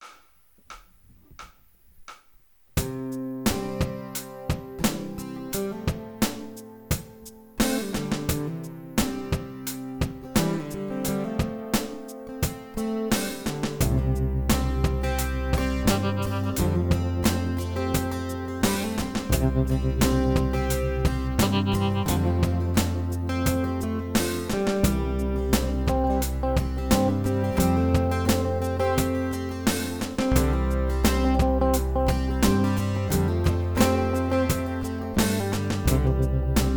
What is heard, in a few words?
more guitars